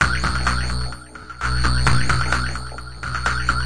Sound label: headspace buzzbrain, synth and electronic loops